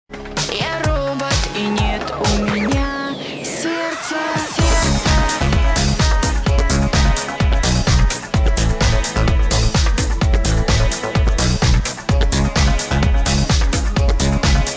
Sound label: Club Version